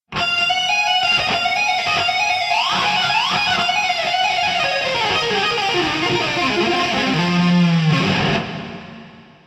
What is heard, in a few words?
lick; brutal legend